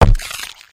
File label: footsteps, run